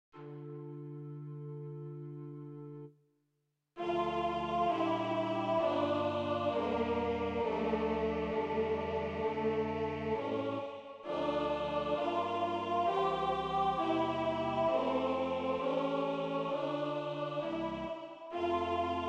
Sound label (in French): Kyrie sans invocation prealable, version chorale polyphonique; Messe de Verrieres; Kruger